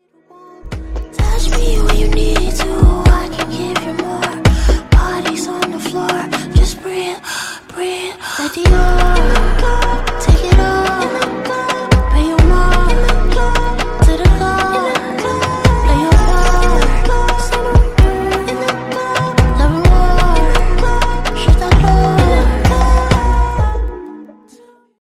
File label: Slowed Ringtone